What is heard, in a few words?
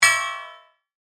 Hit Metallic; Hits; Sound Design; BlastWave